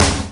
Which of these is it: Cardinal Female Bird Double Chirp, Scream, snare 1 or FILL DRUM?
snare 1